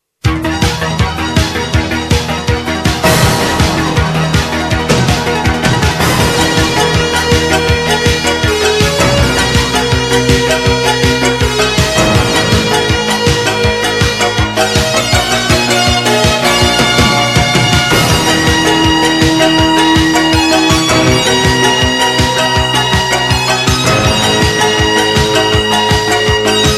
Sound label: baton music